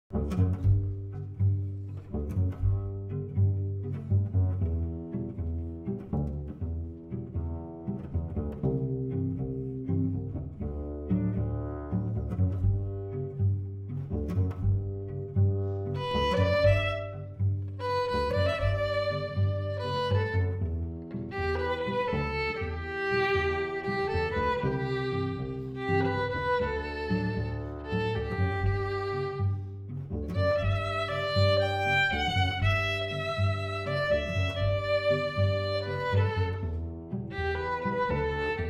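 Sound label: violin cello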